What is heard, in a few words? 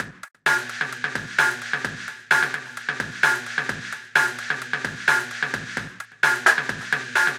Jungle Beat 172bpm, MIDI Drum; Ableton; Factory Packs